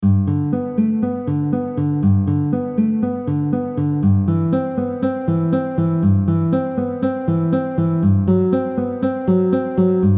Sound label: guitar; Cello Suite No. 1; Bach; Prelude in G Major; BWV 1007